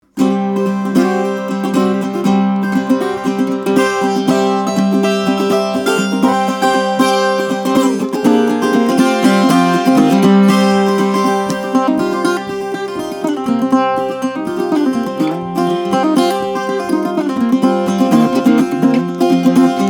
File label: amicus12 artisan, steel string guitar flattop; emerald